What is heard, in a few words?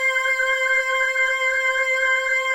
pad, Ether Layers; Cyclotronic Resonator